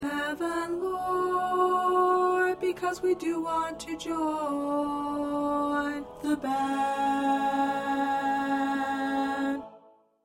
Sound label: Tenor 1